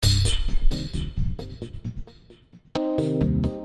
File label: Funky 125-132 Cm7